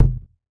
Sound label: short reverb kick drum single hit e key